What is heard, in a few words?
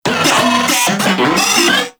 Moa C Vocal Ability; Warframe